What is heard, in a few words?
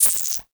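short noise; USB Soundscan vol.14 - Special FX Twisted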